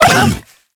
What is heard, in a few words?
Kubrow Feral Vocal Pain; Warframe